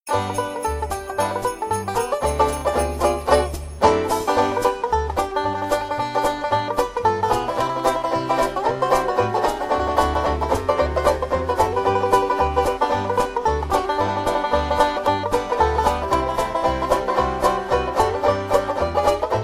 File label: jingle bells